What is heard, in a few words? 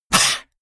monster hiss; koerpergeraeusche; comedy-cartoon; soundpool